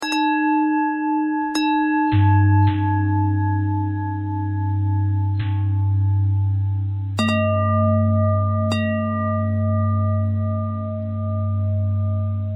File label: bell threads